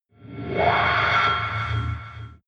alien metallic scream ring reverb; aliens

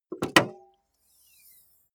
car bmw e46 exterior bonnet open

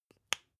spice jar small close soft; industrie wirtschaft; gastronomie

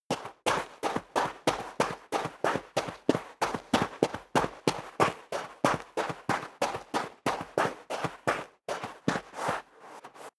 step sneaker sand run sequence; schritte

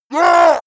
yeti shout short pain

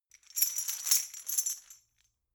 metal chain handle clean

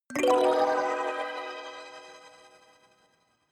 notification kalimba magical slide minor

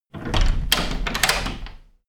locking wooden door

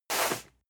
cardboard box medium slide hard; objekte

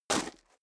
step high heels gravel medium single stair up; schritte; Footsteps; menschen

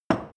drinking glass put down; gastronomie; berufe

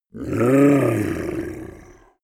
monster dark growl evil; kreaturen

